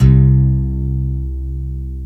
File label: Guitarron C1; Multisamples; Digital Sound Factory Planet Earth